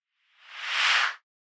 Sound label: Noise Sweep Mid Reverse